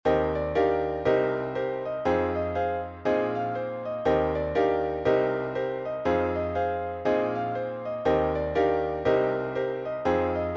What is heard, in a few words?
PIANO Eb